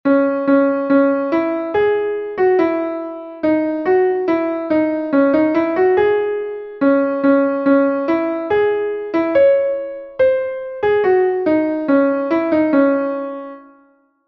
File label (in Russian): diktant v cis moll; solfedgio